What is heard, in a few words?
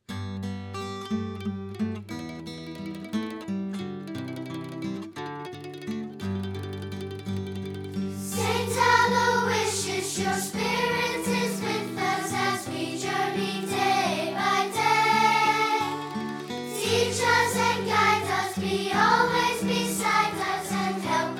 Hymn; Aloysius